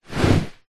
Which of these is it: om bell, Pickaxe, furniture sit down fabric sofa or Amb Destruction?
furniture sit down fabric sofa